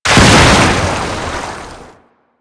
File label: Water Tomb Explosion; Warframe; Yareli